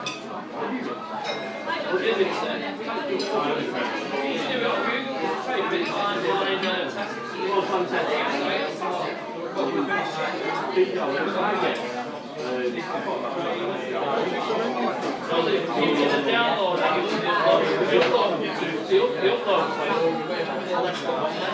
a noisy pub

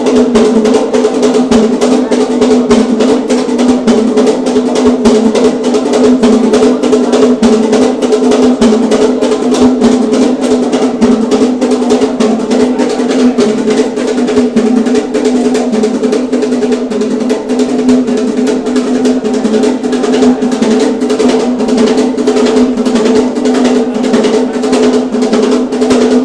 drumming

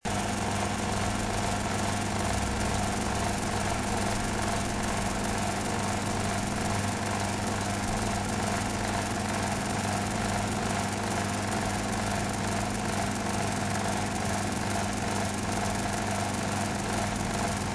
broken fridge

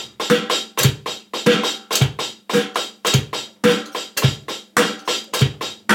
drums

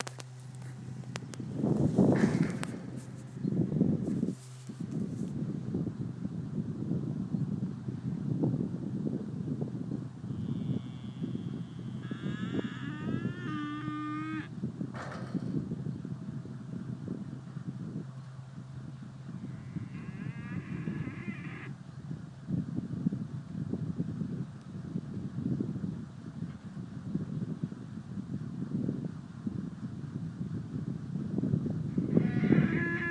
wind turbine

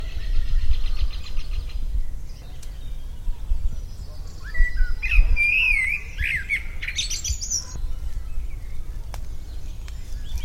blackbird singing; near kinver edge april

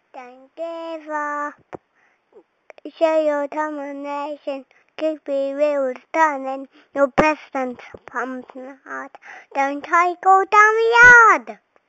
sings a song; from thomas the tank engine

